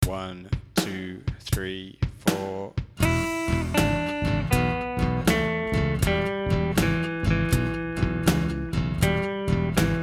blues e solo, guitar

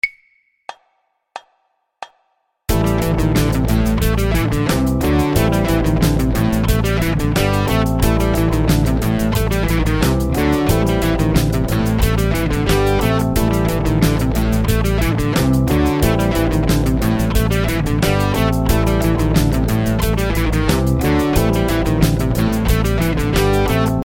jam rhythm, guitar; Jam Rhythm #4

